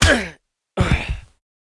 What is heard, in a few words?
ScholarSage M Dead